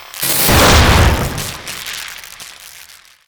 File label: Boost Zap